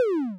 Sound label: Zap